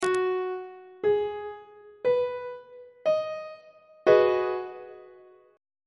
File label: g sharp minor 7th 3rd inversion on treble clef